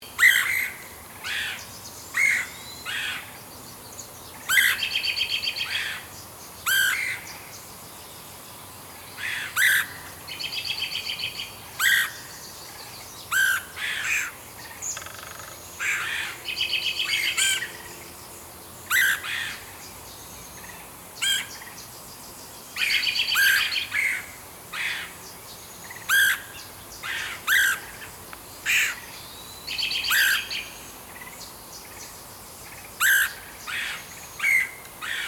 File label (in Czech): datel červenohlavý Melanerpes erythrocephalus